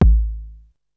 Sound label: huge steel kick drum a key; modern kick drum